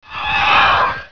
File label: flyby